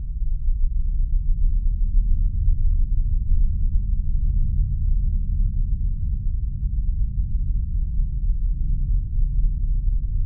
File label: Drone Ominous Dark Deep; Production Element Imaging; AdobeCC